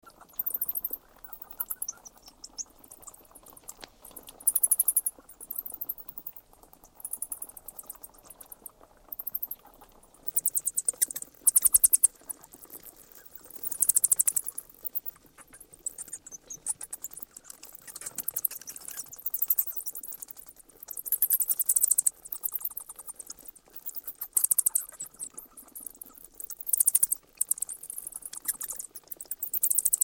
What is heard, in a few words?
underworld cave bats